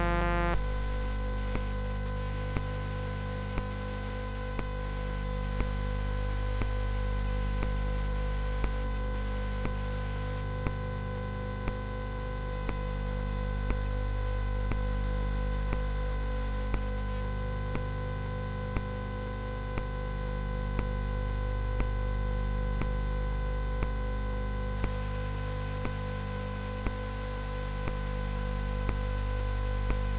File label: Microtuned; sid; CSG8580R5; hvsc